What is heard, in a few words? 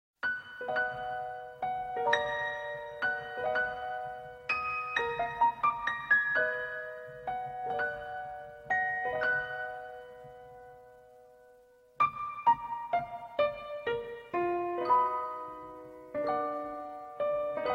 morning grace piano